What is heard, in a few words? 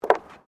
FOOTSTEPS WOMENS HIGH HEELS BOARDWALK STEP; Footstep Foley; Footsteps (Sound Effects:Human)